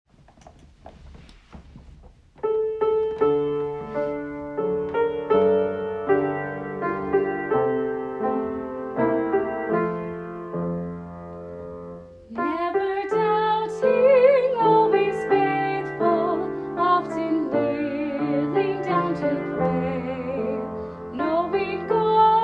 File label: Utah Pioneers with vocals